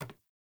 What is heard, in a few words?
Ladder Climb Wood; Player